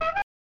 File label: Item Chime (Clown Horn); Krusty's Super Funhouse